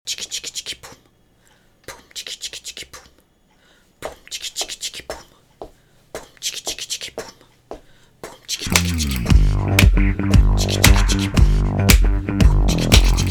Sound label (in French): debut percus